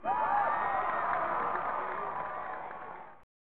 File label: Crowd; PGA European Tour; SNES